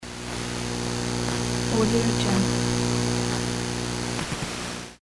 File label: energy field